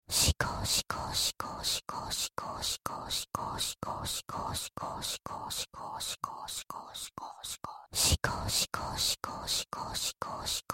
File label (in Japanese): シコシコ 普通